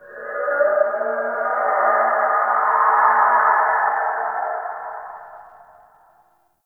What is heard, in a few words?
Signature Call; Ambience; Corrupted Ode